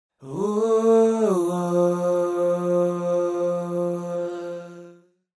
ステレオ化

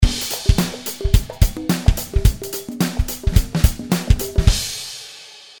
コンガ

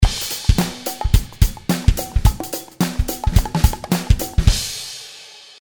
ボンゴ

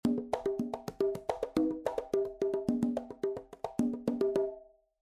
8 コンガ ソロ

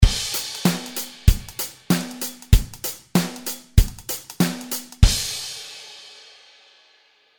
ハイハットチップゴースト

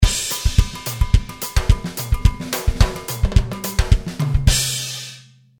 ティンバレス

